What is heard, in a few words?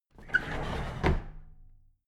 drawer big squeak close heavy